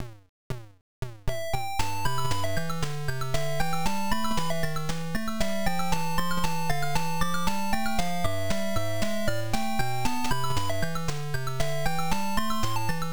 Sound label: Clear BGM Peaceful Forest; Sorcerian System Utility Vol. 1; Sharp X1 turbo; Mystery of Ruby